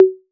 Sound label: TOMS 808